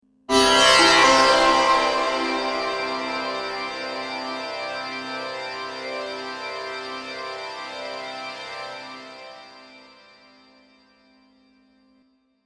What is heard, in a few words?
Sitar, Sitar drone; Strings (Sound Effects:Musical)